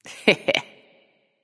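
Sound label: heh heh, female; smite; voicepack; hera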